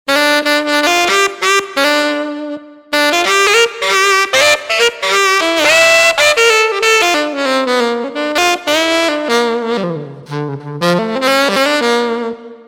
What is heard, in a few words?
Raspy Tnr; TURBO VL